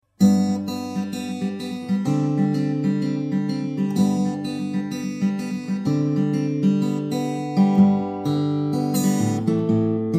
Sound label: fingerstyle